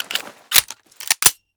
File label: pistol mag in, guns; Weapons; Guns Interact